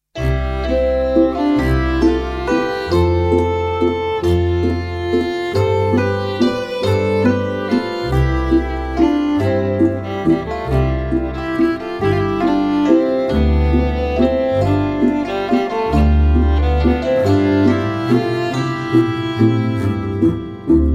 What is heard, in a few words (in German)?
Walzer